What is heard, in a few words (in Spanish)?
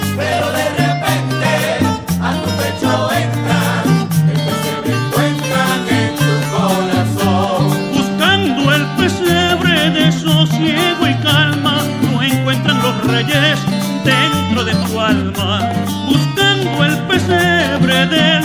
Parrandon